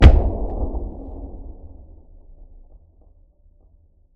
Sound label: Impact Boom Thud; bottlerocket